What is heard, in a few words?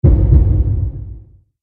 Heartbeat One Shot Monster